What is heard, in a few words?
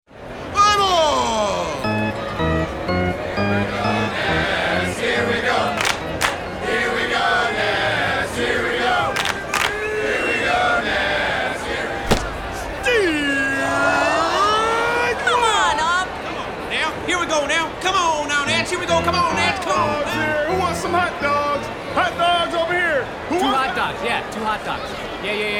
Ballpark Noises; Nationals Baseball; Washington Nationals